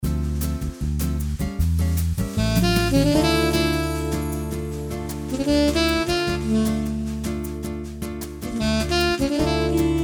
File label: Bossa; AiR Symphony